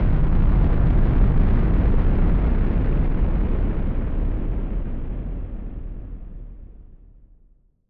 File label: Noise Bomb Rumbler; musicradar; bomb factory